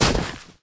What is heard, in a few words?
Footsteps; SFX Character